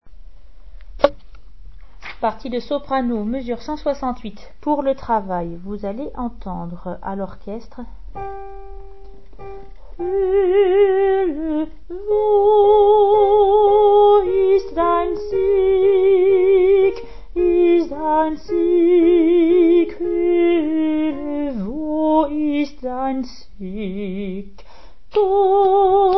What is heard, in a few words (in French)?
soprano moins une octave; Brahms requiem allemand; mesures 168 à 207 moins une octave